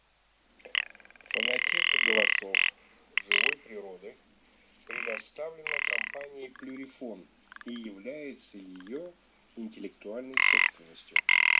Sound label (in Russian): Куропатка тундряная Pernice Blanca; plurifon